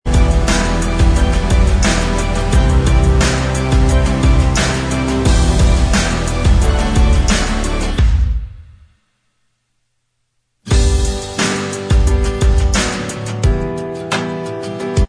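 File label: instrumental, backing track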